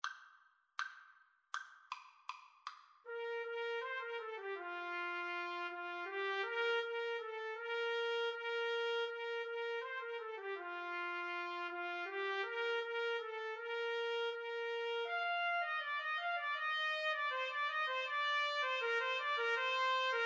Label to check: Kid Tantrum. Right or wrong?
Wrong